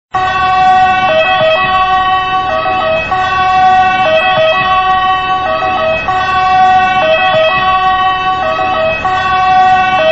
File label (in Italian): Sirena ambulanza